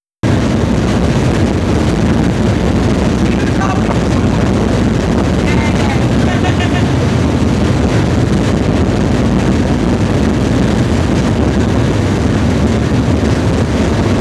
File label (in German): Boot, Koh Tao; Auf'm Boot